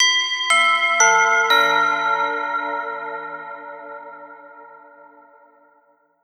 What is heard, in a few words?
bell